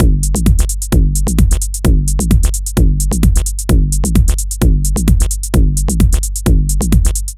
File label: 130 Digital Breaks; loops; Loopmasters; Jon Carter & Alex Blanco; Block Party Essentials